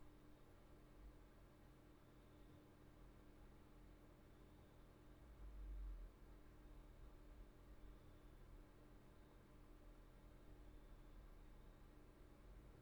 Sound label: roomtone